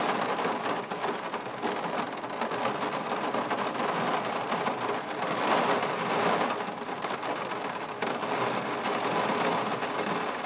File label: heavy rain both inside and out